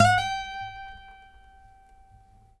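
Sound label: trill, Guitar; Quantum Leap; Guitar & Bass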